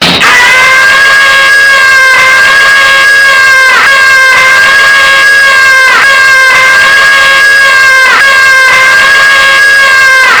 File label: screem loop; ff2